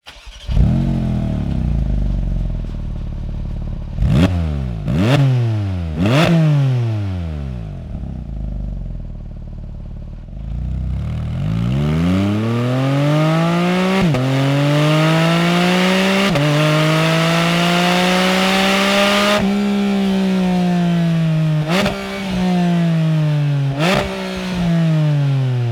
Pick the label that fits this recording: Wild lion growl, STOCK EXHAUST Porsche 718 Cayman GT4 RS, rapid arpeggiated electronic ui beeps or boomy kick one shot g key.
STOCK EXHAUST Porsche 718 Cayman GT4 RS